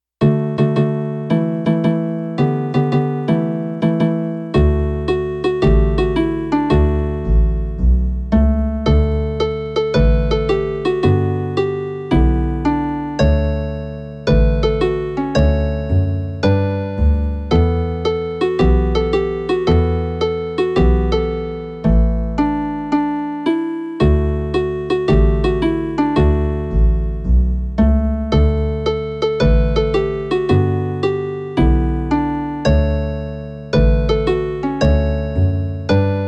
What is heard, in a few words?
raindrops dulcimer with bass